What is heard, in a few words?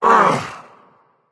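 nonverbal ugh; smite; voicepack; merlin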